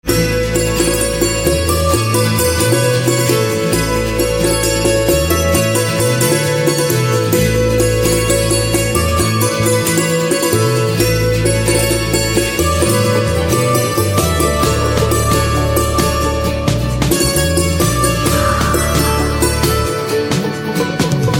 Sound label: Santoor Instrumental Ringtone